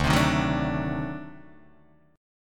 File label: D 7th Flat 9th D down Guitar Open D